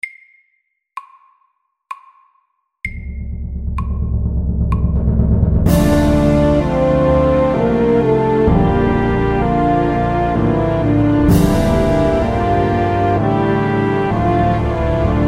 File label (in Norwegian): Filmmusikk; SAKTE